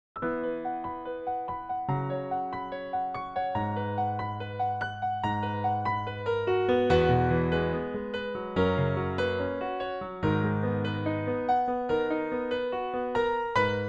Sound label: Piano; Cinematic Pop